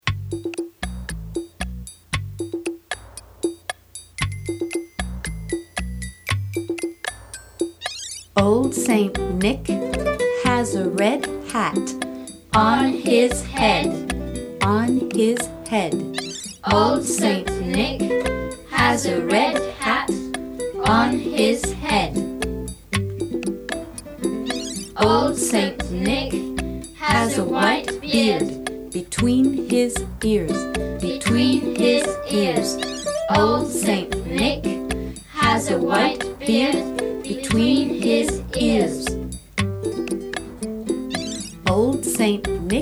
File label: XMAS RAP